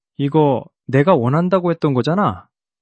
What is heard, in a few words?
surprise